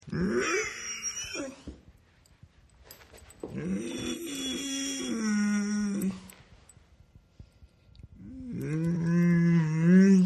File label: Dog Yawns; Dogs; Animals